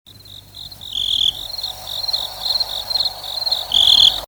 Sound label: crickets